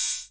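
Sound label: Korg KR-55 hi-hat open; drum machines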